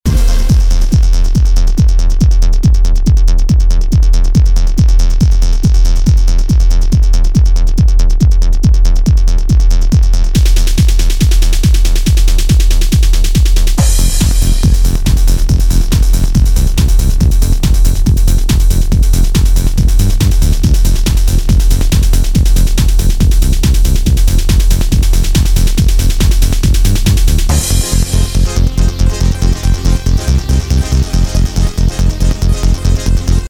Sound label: Boombox